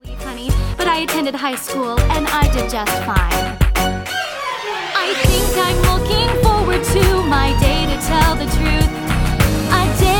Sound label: Vocals